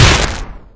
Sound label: gauntlet hit; cstrike; Quake3